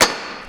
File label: Rail Bang